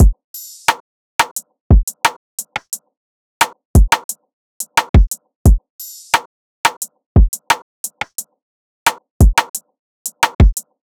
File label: Wood Block Drum Loop 176 BPM; Cymatics; Mystery Pack Vol 4